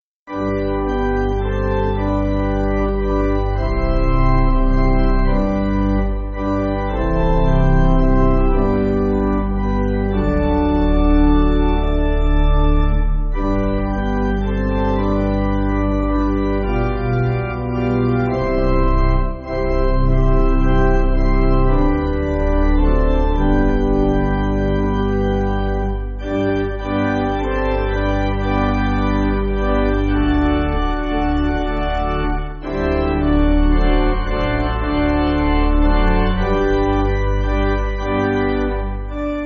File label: Pipe, church music